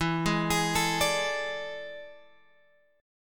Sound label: E 11th; E11 Chord {12 11 X 13 10 10} arpeggiated